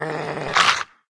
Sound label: rabbit horn attack, monster